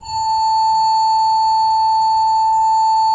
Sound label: SWL A4, Cathedral Organ; ILIO; Propeller Islands